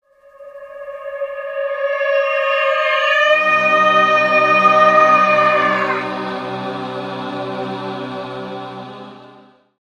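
sound of unimaginable grandeur, terrifying frightening delight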